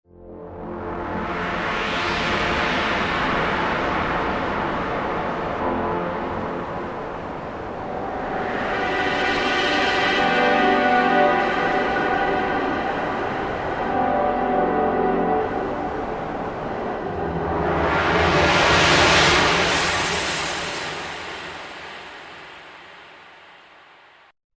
X-Mod Pad, Roland V-Synth; Factory Patches

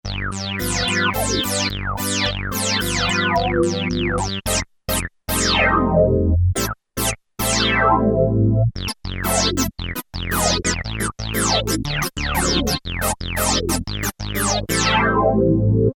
Synthe, Korg PolySix; Factory Presets Bank B

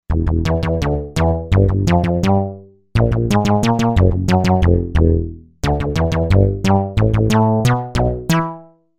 Bwacka Bass

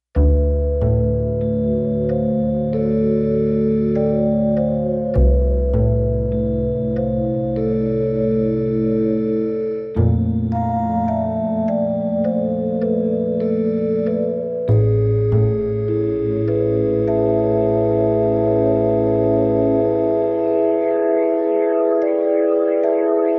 TREE COMBO

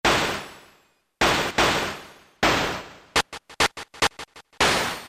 Gunfire - Ricochet, Roland PN-D50; Roland PN-D50-02

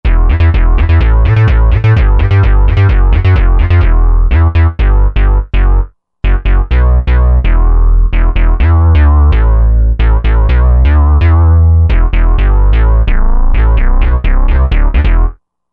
Super Jup Bs, Roland JV-2080

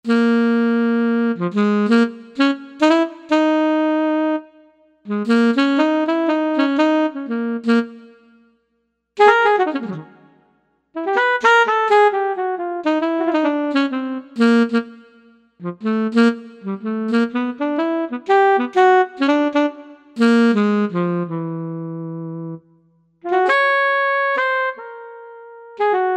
Alto Sax

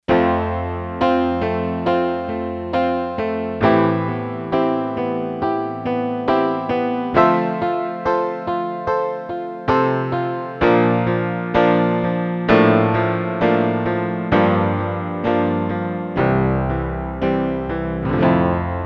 Piano 1, Yamaha TX802; Factory Patches; Voices

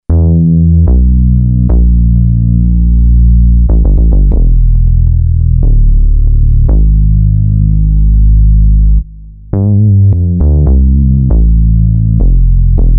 Spy Bass

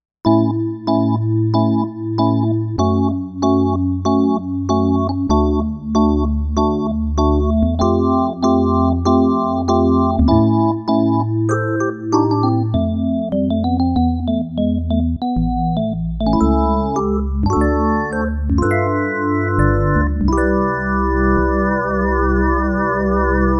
Draw Organ Det

